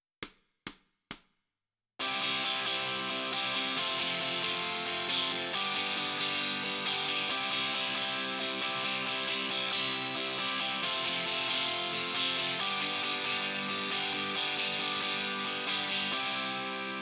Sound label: Guitar Left